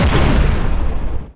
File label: boom; SpaceInvaders